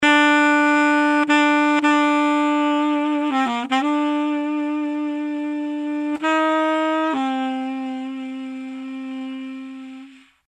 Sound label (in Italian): sax baryton; SAX BARITONO